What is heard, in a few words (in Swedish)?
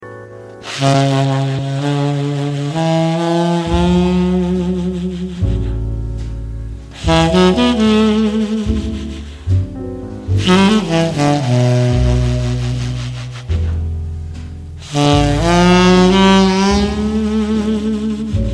långsam jazz